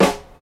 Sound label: low tone acoustic snare f sharp key, steel snare drum